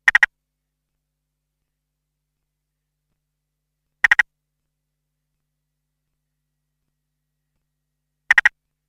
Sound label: Limnodynastes tasmaniensis Spotted Grass Frog